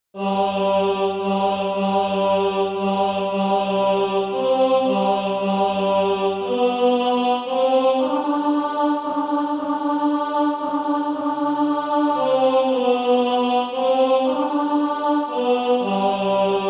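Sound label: tenor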